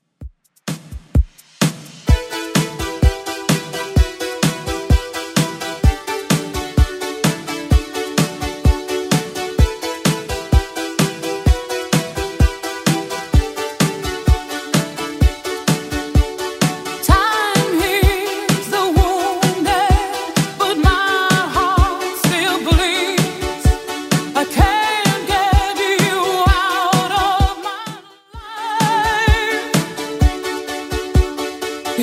80s Redrum 128bpm

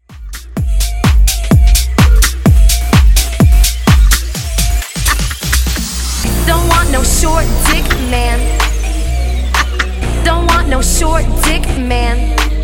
Bootleg House 127bpm

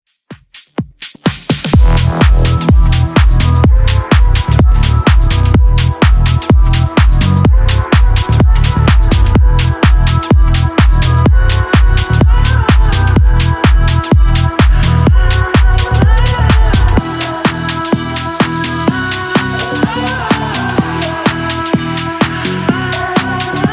Dj Intro Outro 126 Bpm

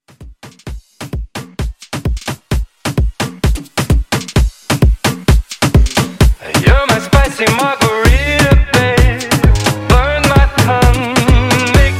Afrobeat Re Drum 130

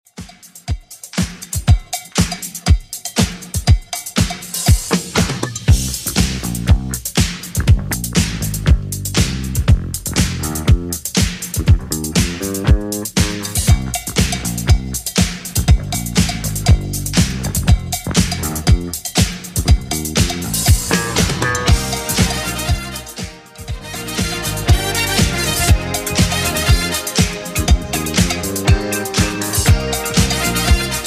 80s Redrum 120bpm

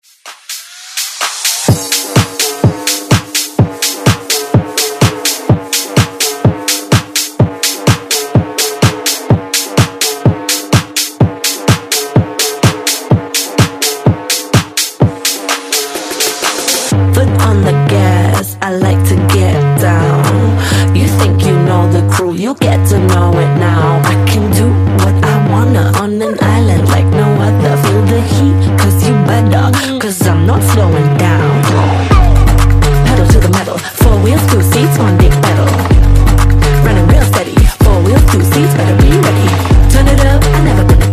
Dance

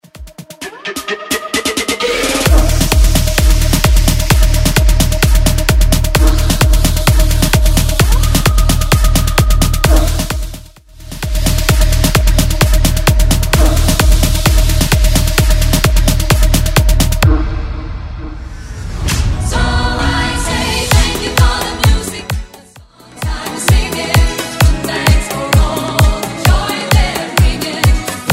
Banger 130bpm

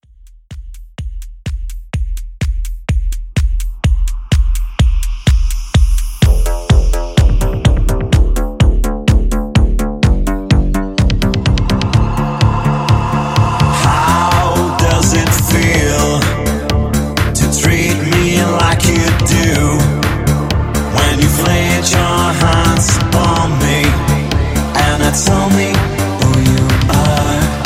Classic EDM; Remixfreakz

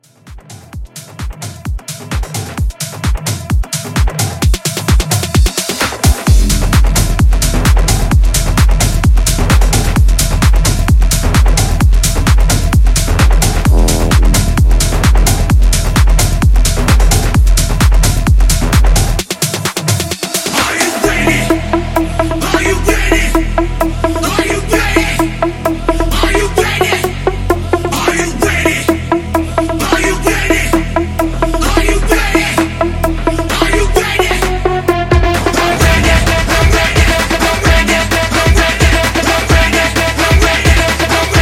EDM Mashup

